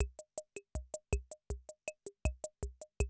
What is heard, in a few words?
CR78, DrumLoops